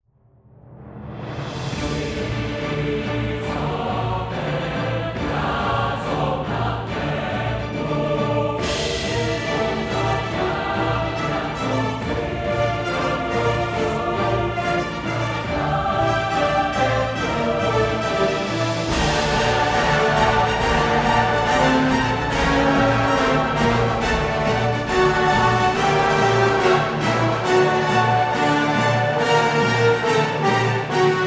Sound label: Kill Spree Tension; Clicker Heroes 2